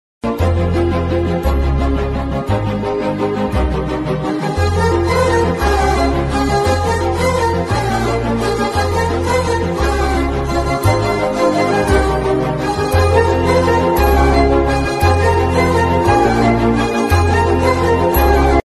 love feeling tamil ringtone telugu ringtone tamil love ringtone notification